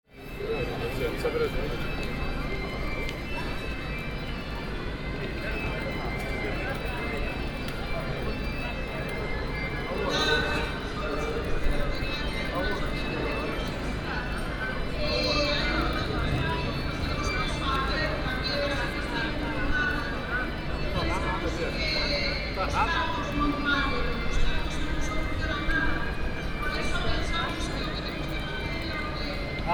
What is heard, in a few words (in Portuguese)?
ambiente